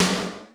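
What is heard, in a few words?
snare drum PITCH; Crypton; DrumBase5000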